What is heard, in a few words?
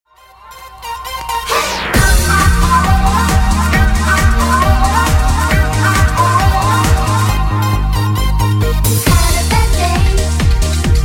Karaoke